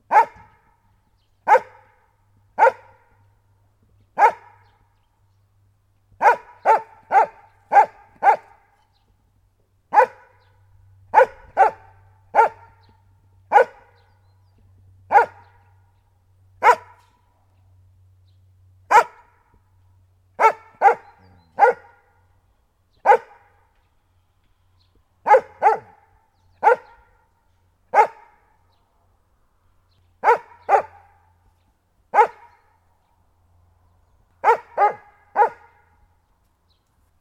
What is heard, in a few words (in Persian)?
sedaye sag nar o made